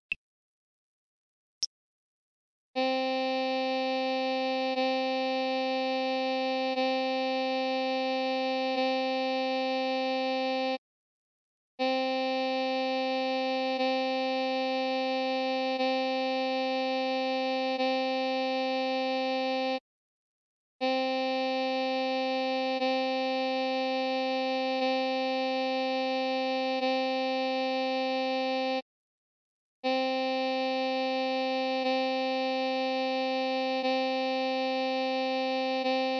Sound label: c harmonica